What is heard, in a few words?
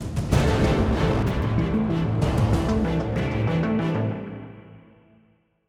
190bpm; Legends of Pegasus; windows gamerip 2012